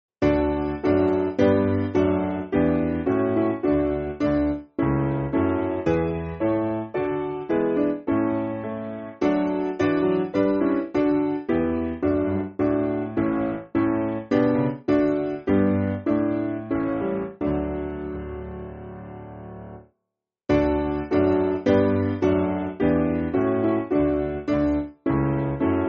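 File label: Piano, Backing Files for Churches; Wychbold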